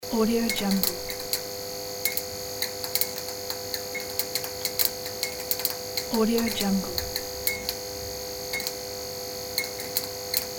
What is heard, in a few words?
neon lamp flickering close loop